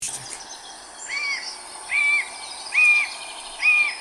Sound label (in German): junge Mäusebussarde; nachwuchs